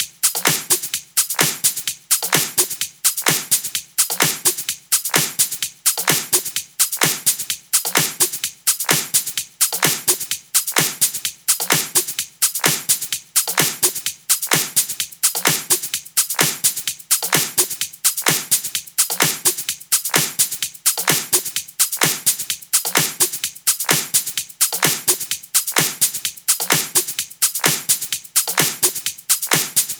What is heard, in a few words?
NuTrance Drums; Mutekki Media; Vengeance Electroshock Vol.2